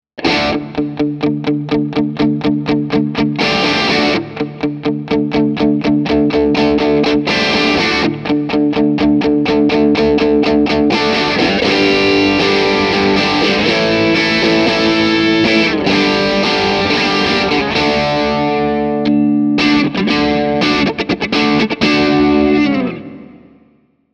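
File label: Strat Light Distortion, Pop/Rock Rhythm Distortion, chitarra elettrica Fender; First bridge pickup, then neck pickup till the end; Modalità: Distortion; Gain: 3/10; Tone: 5/10; Middle: 5/10; Presence: 6/10; Volume: 5/10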